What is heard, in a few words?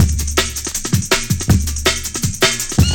Raw 162, breaks; minihalfs